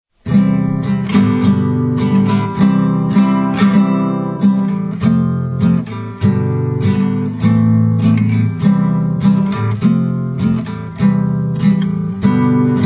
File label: World Song Accompaniment on Guitar